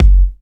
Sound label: Bassdrum Clean